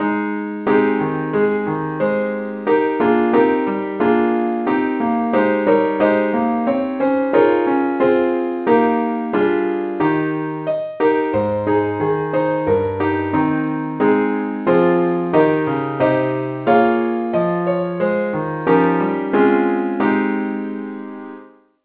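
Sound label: keyboard only key A flat; saintthomas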